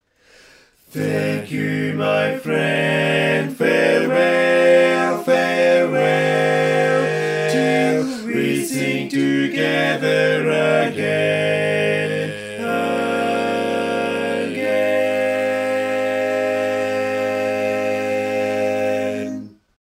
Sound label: barbershop tags, all parts